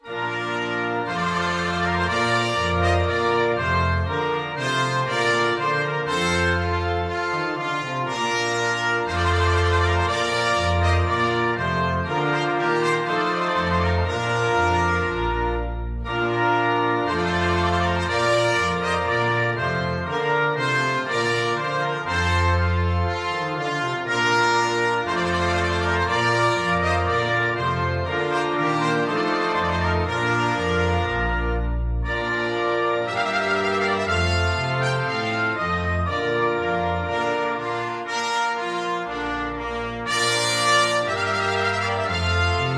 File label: trumpet voluntary full, flexible ensemble and piano 5 players and piano